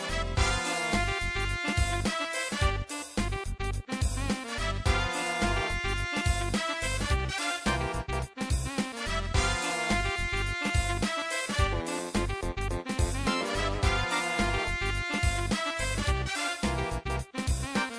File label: ringtone